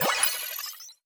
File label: ui complete